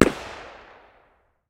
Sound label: ak medium distance, 5.45x39mm; tacz-tweak-pack; taczmechanics